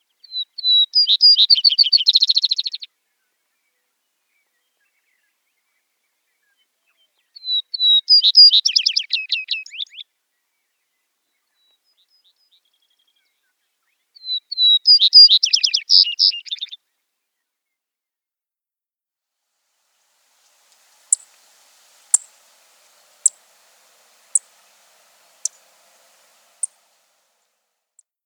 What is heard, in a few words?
Vesper Sparrow